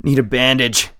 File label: bleed in; Escape from Tarkov